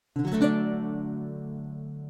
Guitar Chord

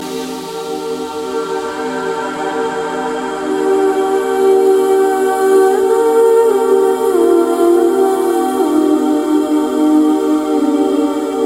Haunting Melody

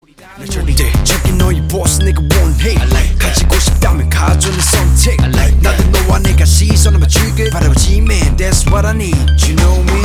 Rap

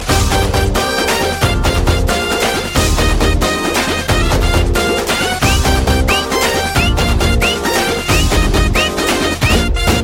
Tai Tai Beat

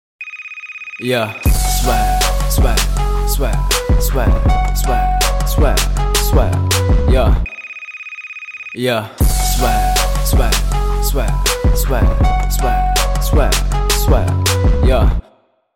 ringtone

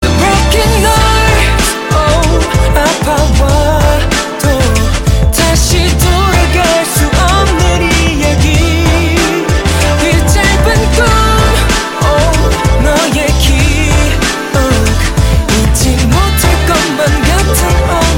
Chorus

